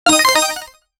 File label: rapid card combo sequence